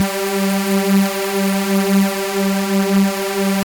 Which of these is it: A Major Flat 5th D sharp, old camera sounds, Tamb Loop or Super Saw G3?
Super Saw G3